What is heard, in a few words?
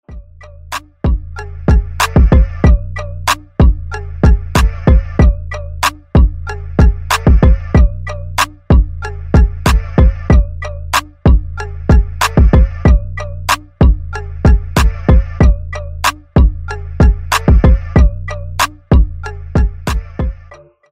Instrumental